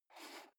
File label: foot switch dimmer slide down